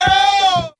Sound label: "oh" sung